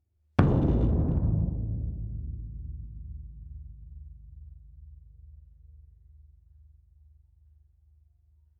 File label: Bass Drum Hit Single